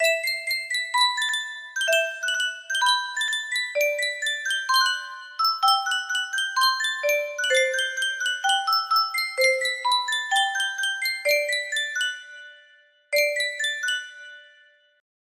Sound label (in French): Yunsheng custom tune boite a musique